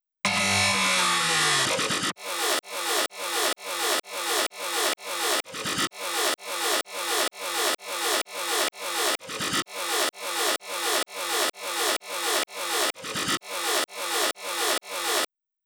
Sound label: Drum Loop Synth Growl A#, 128BPM; VEC5 Drumloops; VEC5 Multi Loops 128BPM; Loop Elements